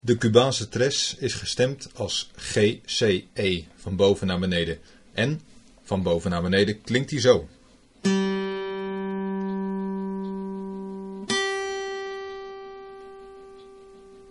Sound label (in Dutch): Tresstemming